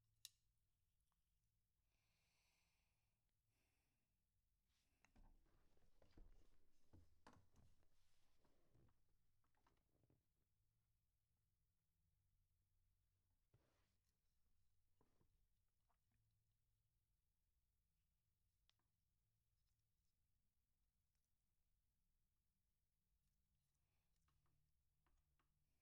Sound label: SNR Top